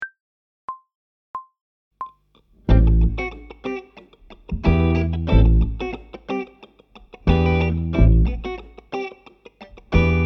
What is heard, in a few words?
Funk 90bpm, Brushes; Phrasing and Freedom with Brushes